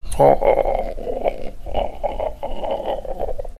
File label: monster laugh